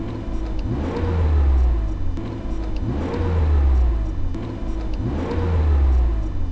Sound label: sound my engine, 300zx z31; mic